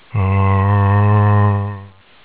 zo idle; rezombie; svencoop

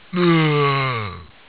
pain, zombie; rezombie; svencoop

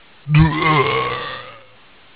zombie alert; rezombie; svencoop

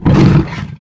wound; balathron; svencoop

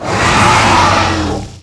primal screaming; tyrant; svencoop

